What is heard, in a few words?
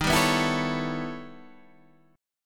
E Minor Major 13th D sharp, guitar